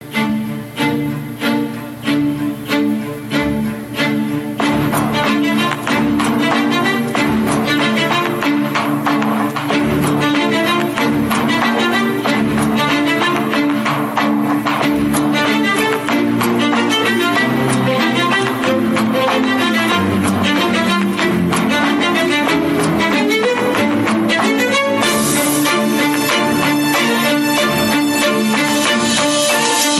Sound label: K-Drama Theme Music